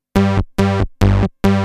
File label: pw bass; Techno Music Maker